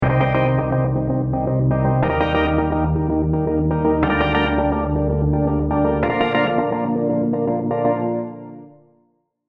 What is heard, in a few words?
vital presets; chiqui audio; vital presets pack